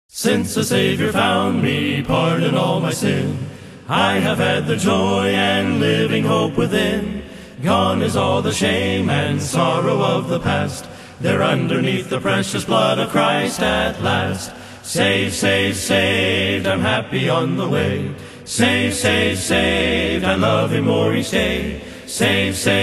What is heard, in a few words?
A Cappella